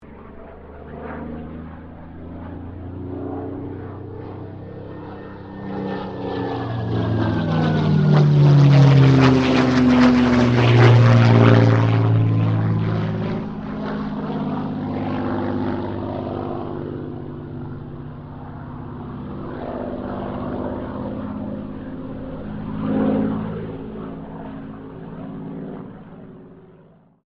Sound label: AIRCRAFT SUPERMARINE SPITFIRE MK-XVI PASS